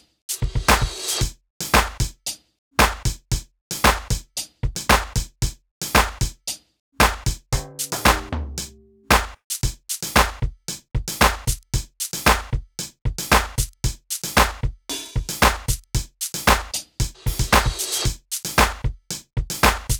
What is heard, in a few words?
percussive